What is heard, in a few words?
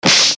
hit